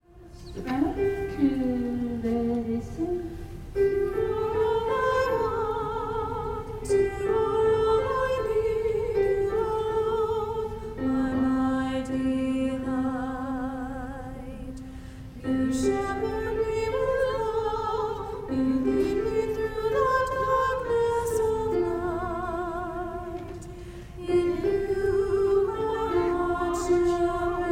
soprano; Gather Hymnal

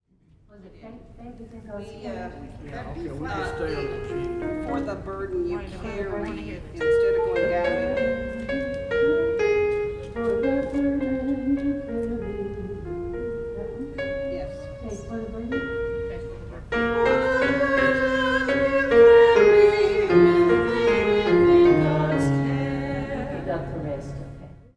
soprano; Gather Hymnal

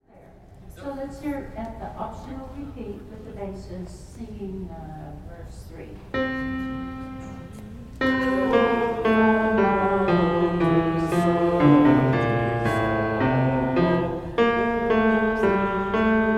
bass; Holy God We Praise Thy Name; repeats vs 3 and 4; Gather Hymnal

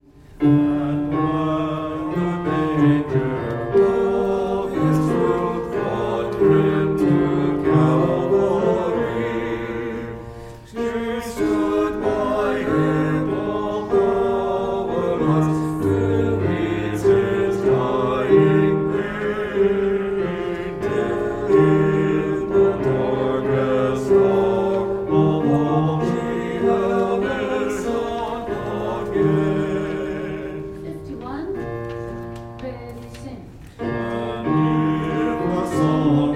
bass and tenor

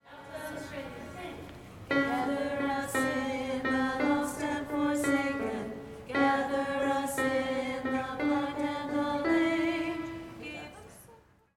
alto

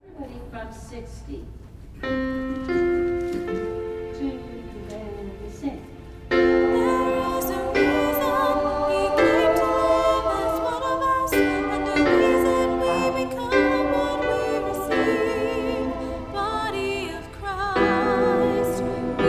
SATB

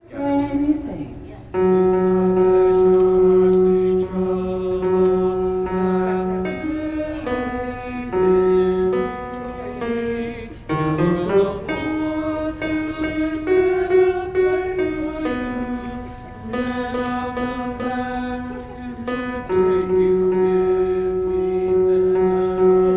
tenor; Gather Hymnal

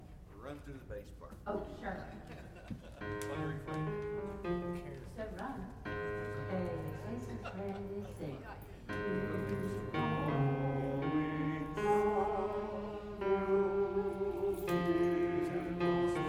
run through the bass part; Gather Hymnal

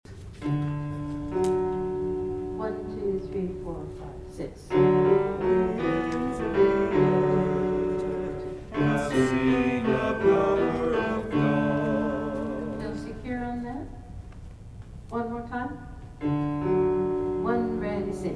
bass and tenor; Gather Hymnal

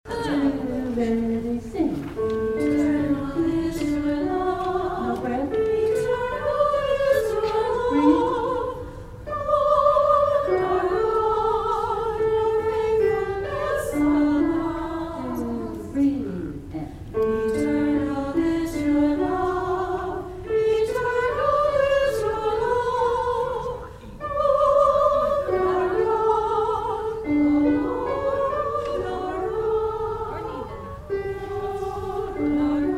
alto and soprano

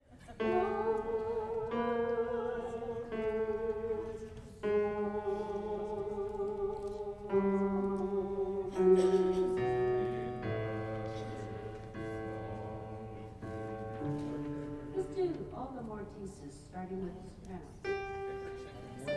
bass; Ave Verum Corpus

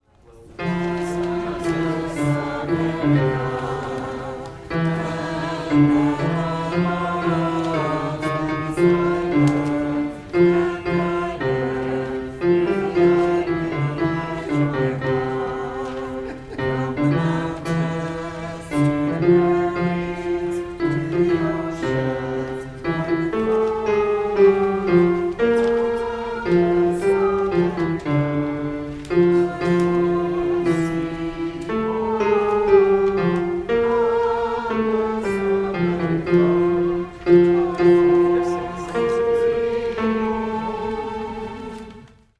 alto

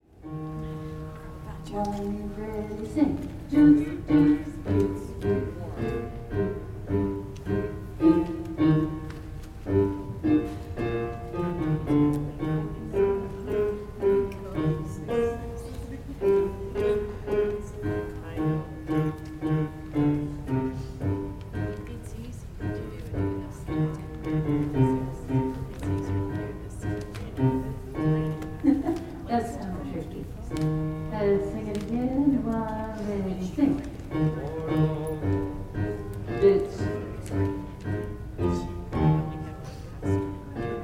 bass on doot; Gather Hymnal

